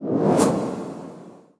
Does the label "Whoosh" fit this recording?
Yes